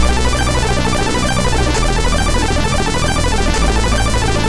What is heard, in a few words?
sid MOS6581R3; Dragon Breed